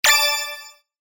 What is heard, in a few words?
break and chain